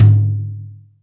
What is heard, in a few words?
Lite Lo, Acoustic Tom; Yamaha DTXpress